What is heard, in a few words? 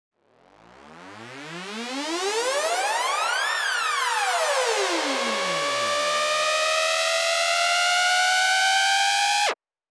140 BPM Break Uplifter; Vengeance - Essential Clubsounds Vol.5; Catch a Plane Kit; A#min